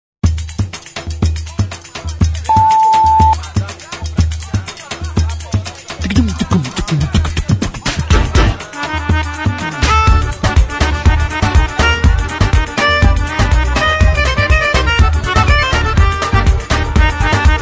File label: Market Forro; RIO